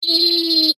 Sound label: cartoon voice high pitched head shake or lip wobble